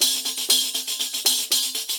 120, MIXED DRUM LOOPS 120; World Instruments 2; WORLD DRUMS

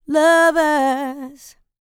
licks, vocal, dance; ILIO; Vocal Planet

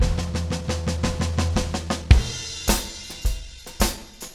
110 intro; Big Fish Audio - DOM; SWEET

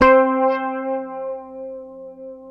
Fingered bass C4 R; Sampleheads; Will Lee Bass Library

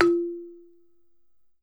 BALAFON, African Melodic

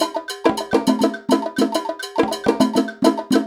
Percussion, Cinematic Funk Urban; Sonic Foundry (Sony Creative Software); Cinematic Funk Urban Tone Poems

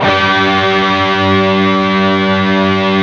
PRS PC G#3, PRS PWR CHRD, Guitars

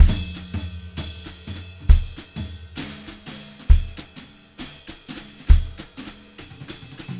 200 JZ VAR1, Brushed Drum Loops; USB Soundscan vol.39; AKAI; 90 s Sample CDs

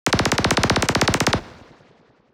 Blaster Fire, cartoon Foley Fx; musicradar; cartoon caper

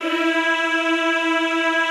Classical Choir, E3; Best Service; Extended Classical Choir

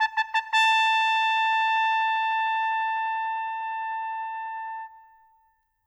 Trumpet, Orchestral; Sonic Foundry (Sony Creative Software); Orchestral VOL-2; Modern 09

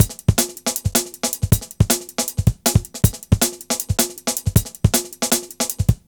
Flying 158, Drum Loops, Jungle; Jungle Warfare 1&2

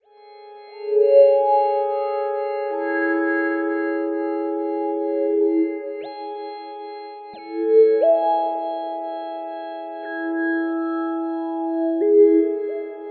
Slow World, Harmonic Atmoshperic

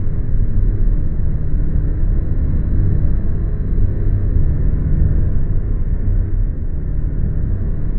Drone Ambient; Drums & Drones

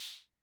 Hi Hat Cymbal, rhythm king; classic machines

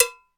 Mambo Cowbell, Percussion; Roland BK-5

